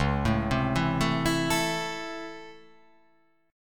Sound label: Db Minor Major 7th Sharp 5th Db; DbmM7#5 arpeggiated; JGuitar